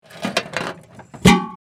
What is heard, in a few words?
Tactical gear box close